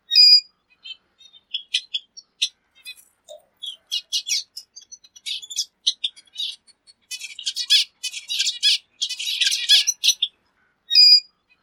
Canto do periquito esplendido

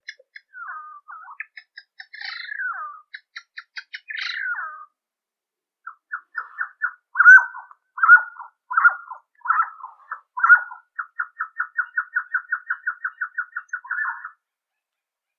Canto do codorniz serrano

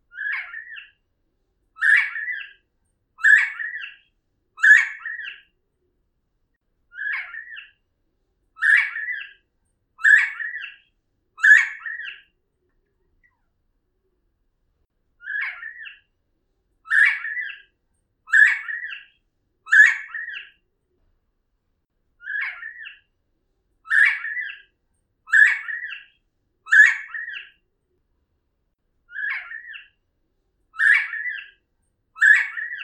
Canto da codorniz da virginia

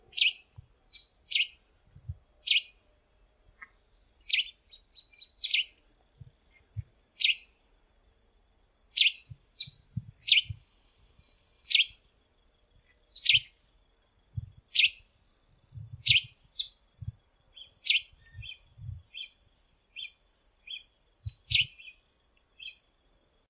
Canto do Sanhaco de Fogo

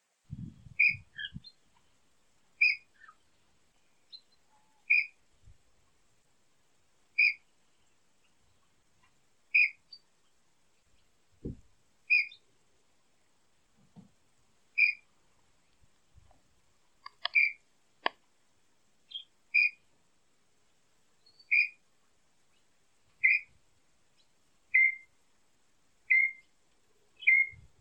Canto do Inhambu Chororo

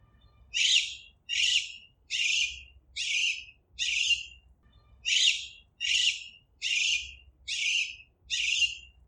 Canto do Periquito alexandrino

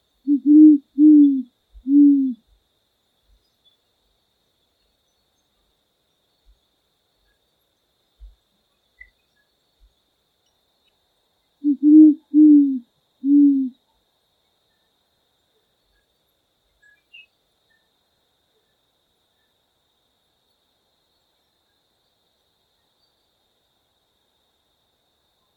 Canto do Jacurutu

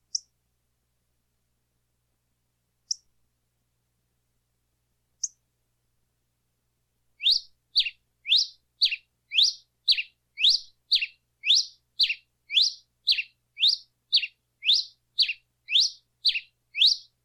Canto do Tico Tico Rei Vermelho

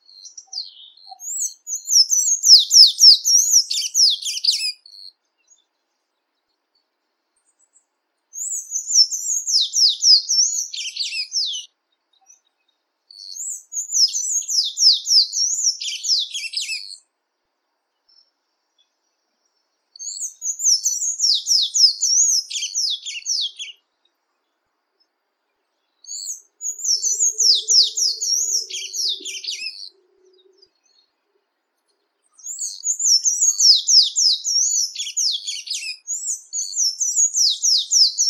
Canto de Sanhaco Cinzento

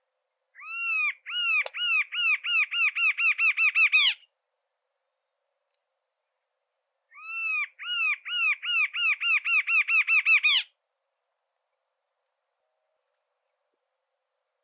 Canto da choca de chapeu vermelho

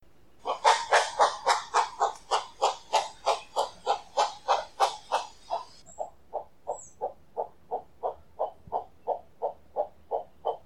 Canto do Jacupemba

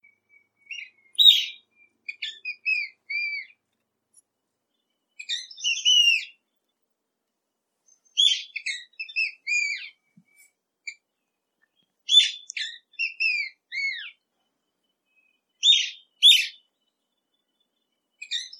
Canto do arapacu escamado

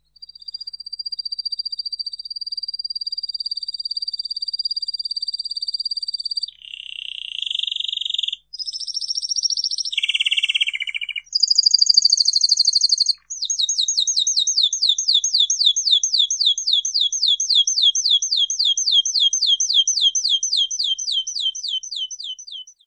Canto Canario do Reino

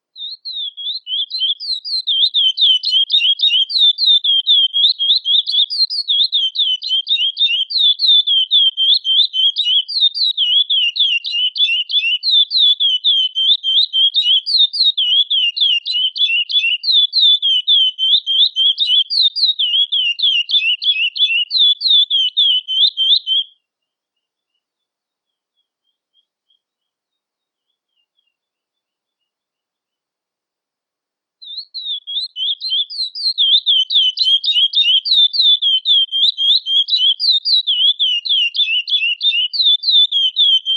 Canto de curio Praia Classico

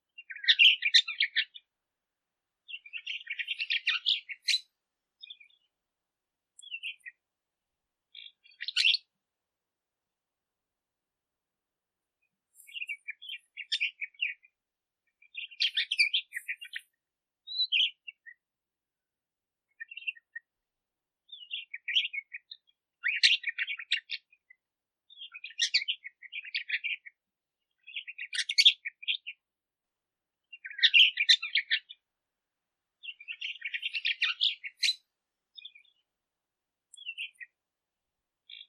Canto do Red rumped

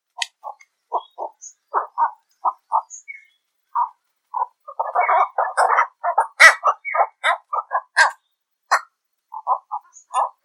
Canto da Garca vaqueira

